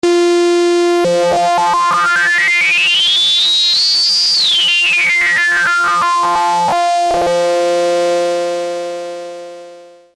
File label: sync no skew; Thomas Henry